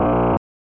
Classic Hit; Pitfall The Mayan Adventure; SNES

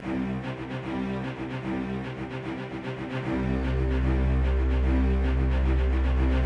sounds music; Halo Combat Evolved; Xbox

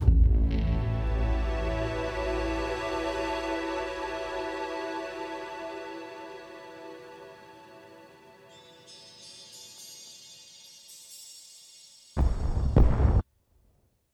Jingles

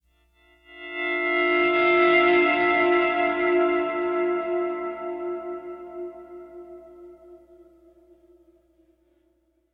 Pleasant Guitar; The Last of Us; ML Pleasant Guitar Mood

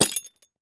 glass hit break

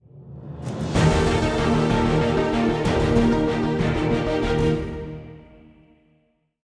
190bpm; Legends of Pegasus; windows gamerip 2012

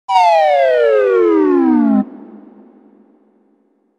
Zoom Out; Banjo-Tooie

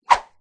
Swoosh

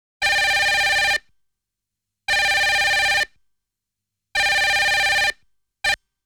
Pause alarm sound; dondokodon

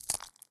Honey Tile Lid Soft Crack; Candy Crush Soda

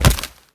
hit bone; Rapids; scrat

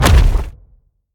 Medium platform stop; ice age scrats nutty adventure

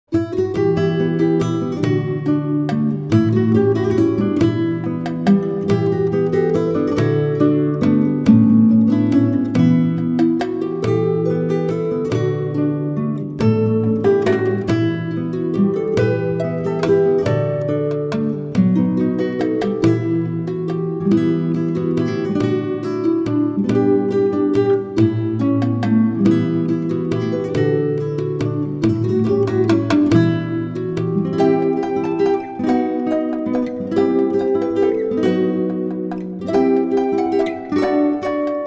Tavern; Two Worlds 2

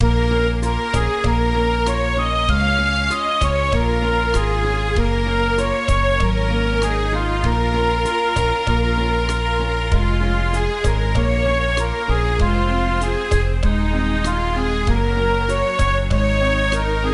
Quiet Melody; Master of Five Light DS; nds

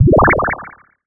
Bubble Shield; Nicktoons Nitro; arcade

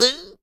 vault vocal; Scrat

